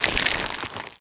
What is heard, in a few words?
zombie move, foley; Half Life Model Pack